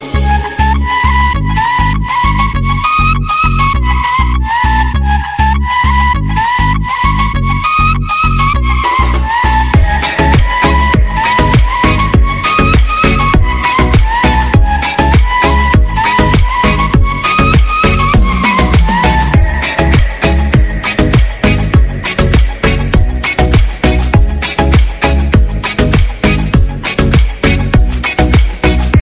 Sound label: best flute ringtone